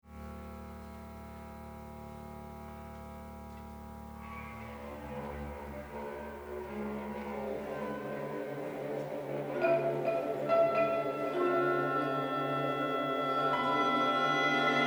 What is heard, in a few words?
violin solo